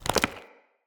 Mockery Foley Melee Swing; Enemy; Mockeries; Characters

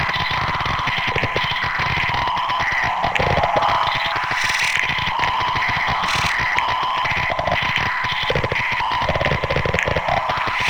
Jump Pad Loop; Warframe; Shadowgrapher

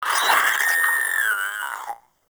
Proto Infested Maggot Awake; Warframe

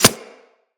Arrow Impact; Weapons; Bullet Impacts

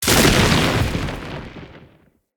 Random Lightning Discharge; Characters; Enemy; Abilities; Nimrod

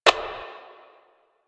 Static; Warframe; Lasrian; Lasrian Enemy A

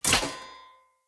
Short Sword Throw Medium Impact Metal

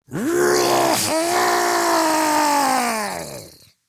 Attack Grineer Ghoul

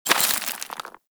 Bullet Impact Debris Rock; Warframe

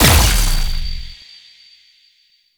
Fusion Pillar Pulse; Warframe; Qorvex

